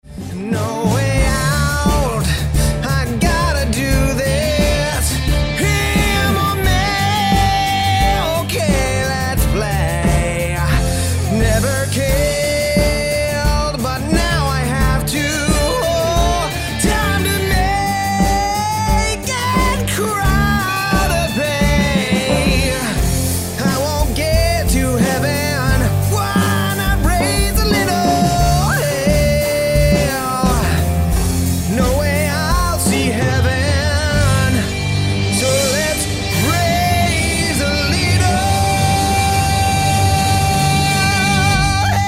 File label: musical theatre rock, singing